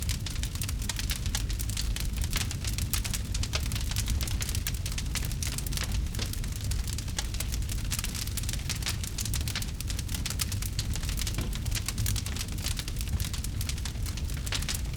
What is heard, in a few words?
paper plastic burn